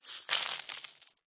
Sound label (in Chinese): 硬币获得金币散落桌上金钱音效